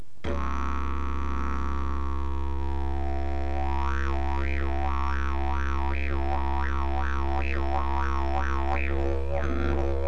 jilm, didgeridoo; jilm bojovnik

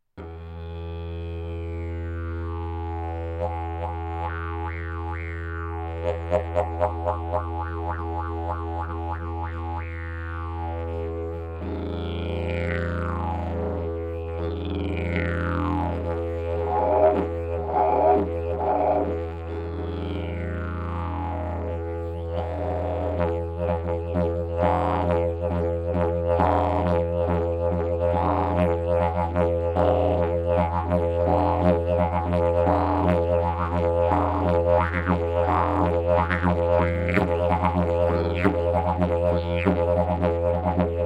javor strom, didgeridoo

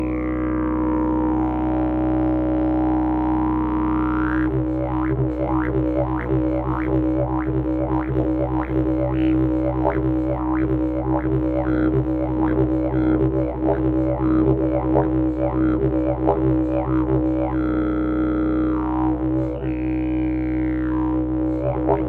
olse, didgeridoo; dominant

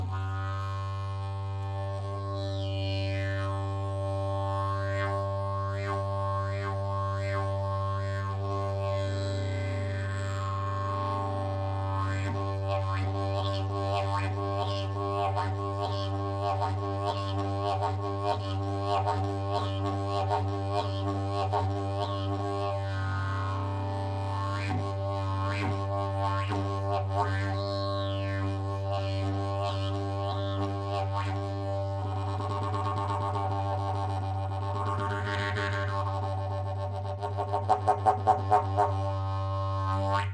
jilm, didgeridoo; jilm cestovka

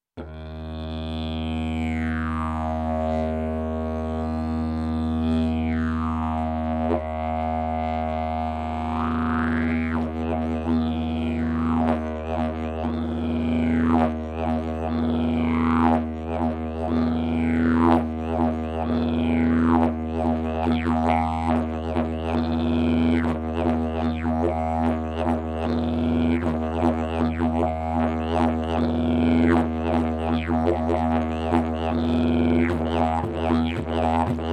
javor hnedy, didgeridoo

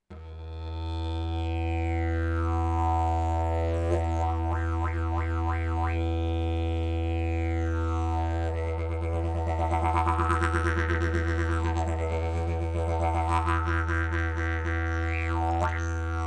javor cestovni, didgeridoo

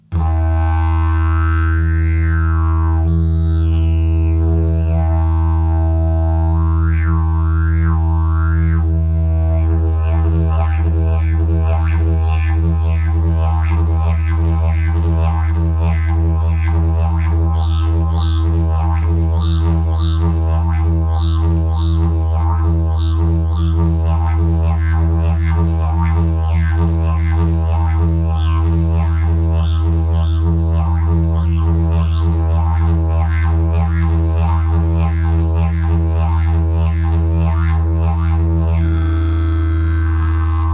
jilm, didgeridoo; sukozvuk

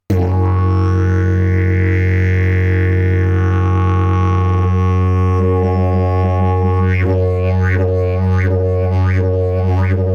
olse, didgeridoo; zimni

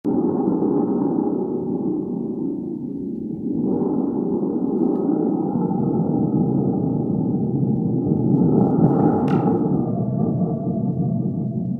hromovy buben velky

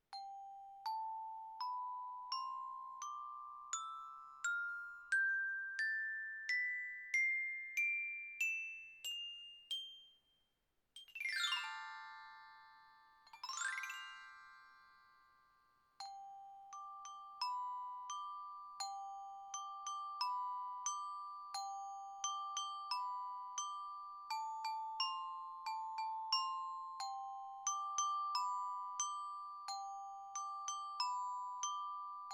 metalofon

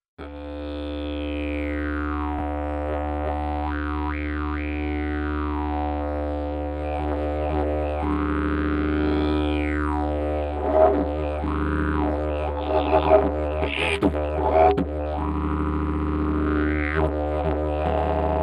javor koren, didgeridoo

